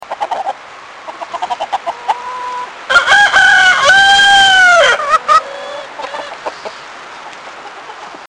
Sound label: medium rooster crowing